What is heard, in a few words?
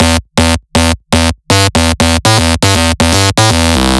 synth loop 128 E